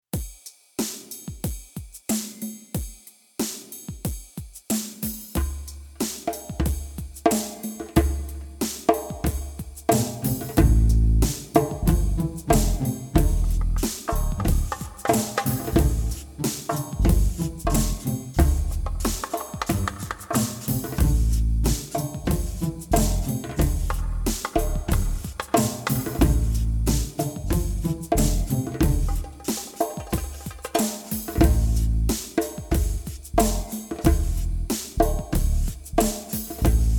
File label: Slow Groove